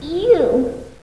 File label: "eewwww"